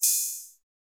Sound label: oh, Open Hats